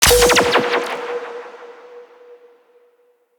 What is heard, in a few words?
Insect Med Station Heal; Liset; Liset Powers